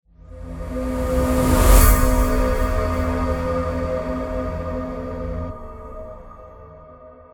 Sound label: Transition End; Everspace 2